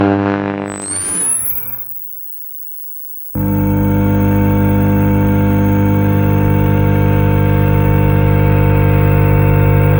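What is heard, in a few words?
old cathode ray tube monitor, powering on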